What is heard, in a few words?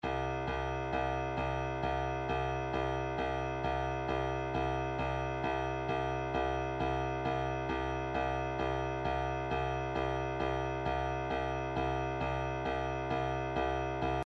kick, midi